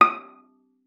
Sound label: D#6, VIOLA PIZZ; Xsample VOL-5; 90 s Sample CDs